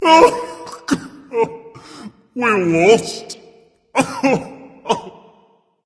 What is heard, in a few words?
boohoo berserker; cuchulainn